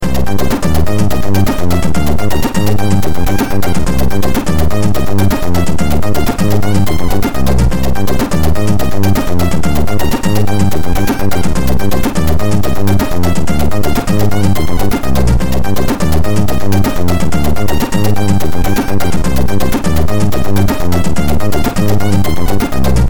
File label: groovin Mono Boom